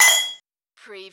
Button Sound - Metal Cup Dong

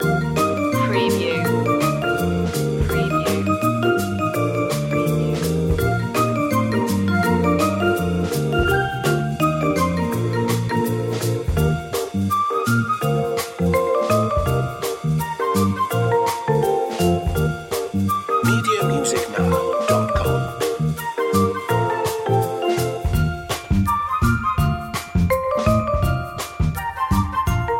scooter ride loop

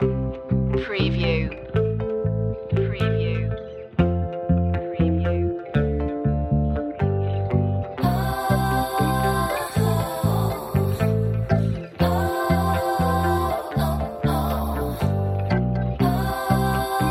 joyful pop no drums

